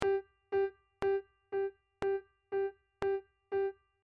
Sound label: Straight 8ths